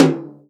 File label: tom, Real Drum Kit, jungle kit